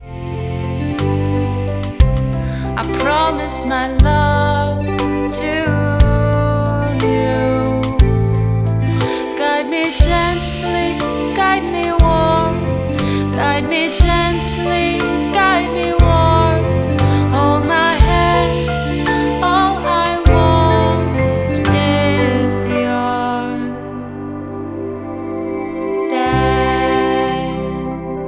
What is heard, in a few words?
Love Pop